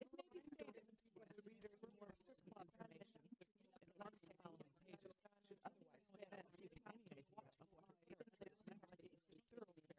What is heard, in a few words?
female inst sim; SASSEC07